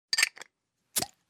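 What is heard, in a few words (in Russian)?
звон стекла и открывание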